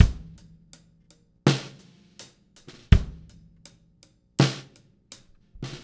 Beat, Hip-Hop

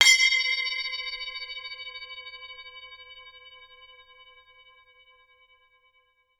SPINNIN, BELLS CHIMES; Voice Crystal - Steve Reid Definitive Percussion

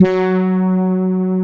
HR G3, ANA HRN

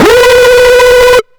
EL SCREAM, Hard & Loud Techno; USB Soundscan vol.01; AKAI

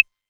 Clave, Novation Drumstation

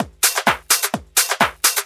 Discohouse Loop 128 BPM

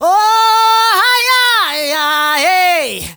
MALE SOLO, Heart of Africa

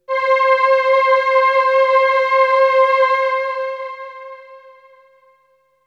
Melody oneshot; ZERO G - Datafile DISC 2

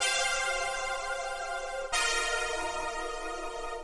125 Soft pad G, Keys, Nu Jazz House; PowerFX - Nu Jazz House

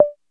909 cowbell; E-MU Formula 4000 Series Vol. 2; OrbitPercMaster

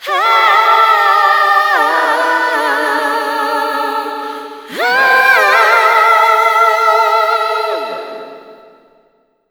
AHAHAAHA, ADLIB WOM, Funky Vocals; USB Soundscan vol.18

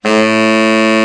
TENOR, Tenor Sax; Tenor Sax 1

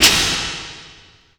snap, percussive

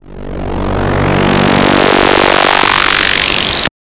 Upward; Drum & Bass; FX; AMG - Prototype Drum & Bass

